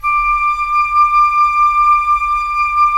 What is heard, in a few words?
FLT FL, Flute; Roland - LA Composer vol. 4